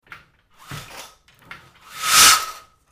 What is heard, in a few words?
blinds venetian lower quickly